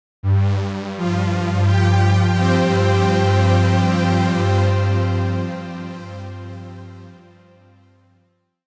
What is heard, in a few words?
Long String; Cherry Audio GX-80